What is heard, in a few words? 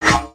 Swing; Valiant; Sorcerer; aronwk collection; ndaudio